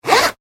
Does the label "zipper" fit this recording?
Yes